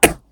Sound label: hit